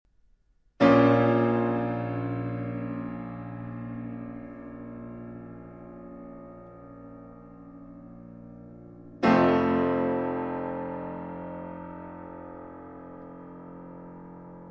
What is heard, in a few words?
Piano Trio in E Minor, Largo; Piano Trio No. 2; Op. 67